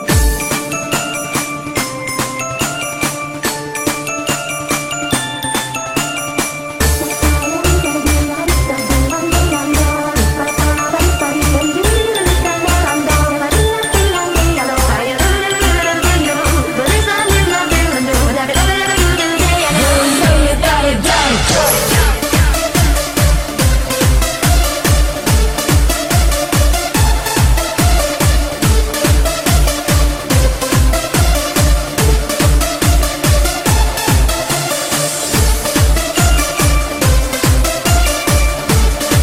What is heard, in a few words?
Tanz Jumpstyle; Rhythmische Sportgymnastik